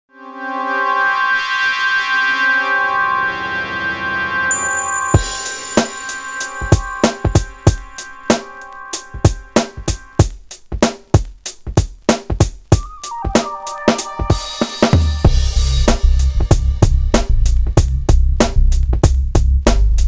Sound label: Funky